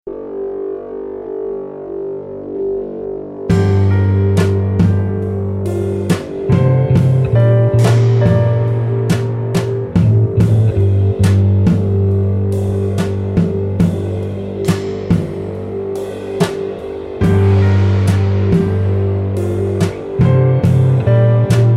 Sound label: droned; soundscapes 3